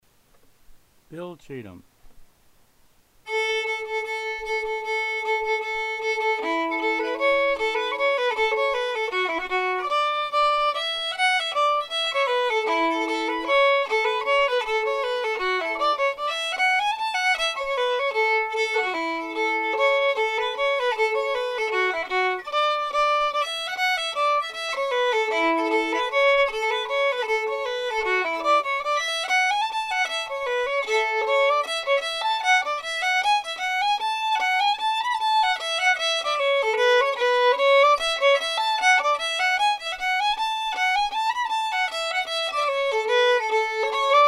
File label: fiddle; Bill Cheatem; festivaltunes; fiddle set 1